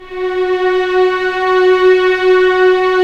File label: STR, Vlns Orch; Roland L-CD702